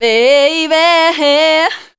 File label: voice spectral, ly short